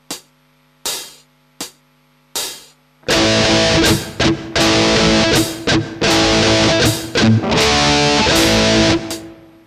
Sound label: mute, muting and palm muting; advanced techniques